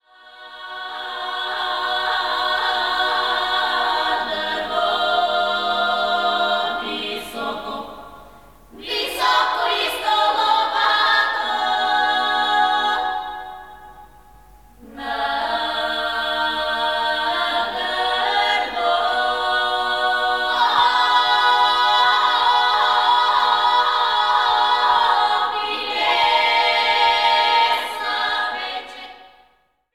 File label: female choir, bulgares